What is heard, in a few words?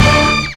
SCREAMIN HIT; Techno Trance; E-MU Formula 4000 Series Vol. 2